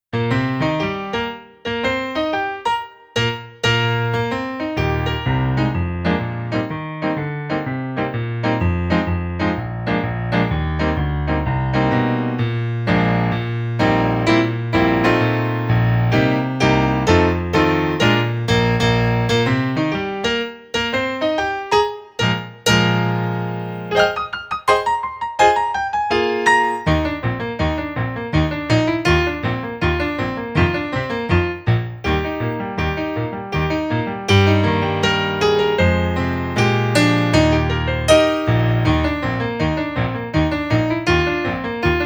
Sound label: Keys Only